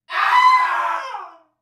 scared ah scream

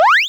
picking up items

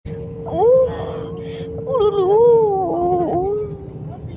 ouh ouuuh

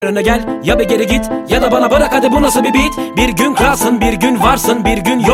turk marsi turkish march

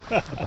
hehe; plantebogen

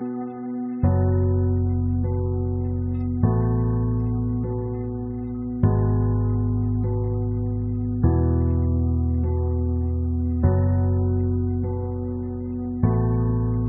dramatic confrontation

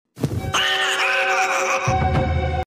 boo scream; luigis mansion 3

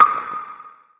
bowling knock; wii

